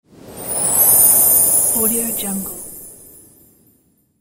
christmas whoosh

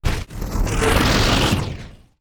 wooshes transform sweetener; soundmorph

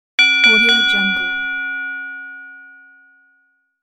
boxing bell

